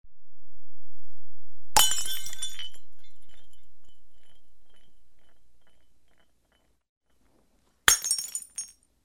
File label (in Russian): vinnye fuzhery